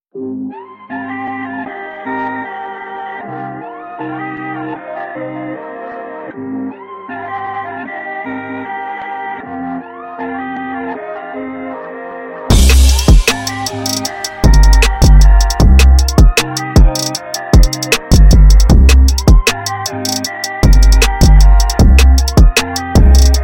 Instrumental Rap Hip Hop Beats Fast Chill Trap Beat